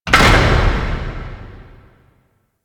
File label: Temple Tunnel Door Open A; Warframe; Harrow; Red Veil Temple